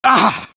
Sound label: pain sharp; engineer